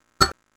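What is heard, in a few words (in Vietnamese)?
tiếng đặt bộ đũa lên chén bát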